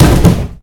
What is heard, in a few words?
bathtub fall; Golden Crayon Sounds